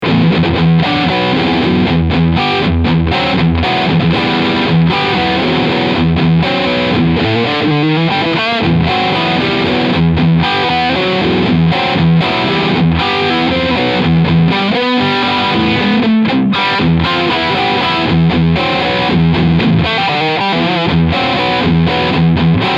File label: Overdrive Session Steg EMG